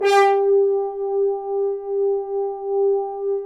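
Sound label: french horn, accent; Roland L-CDX-03 Disk 2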